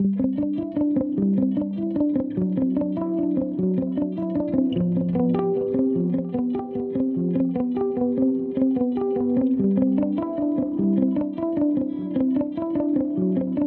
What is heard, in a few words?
interlude jazz